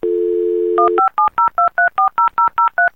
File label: Modem